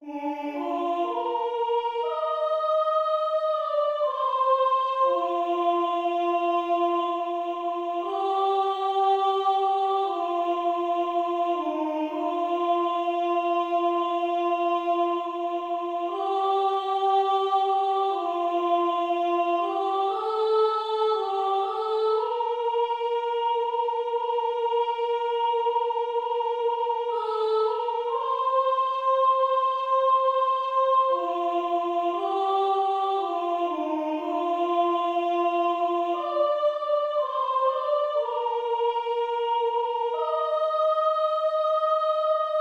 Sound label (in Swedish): irish sopran